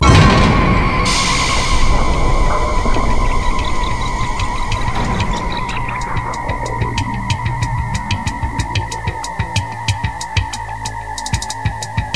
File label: bg music intro; svencoop